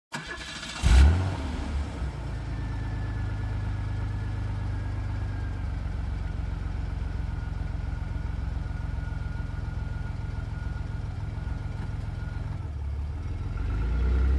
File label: Muscle Car engine ignition drive away